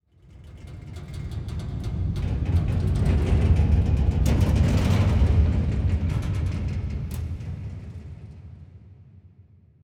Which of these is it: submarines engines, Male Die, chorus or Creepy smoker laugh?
submarines engines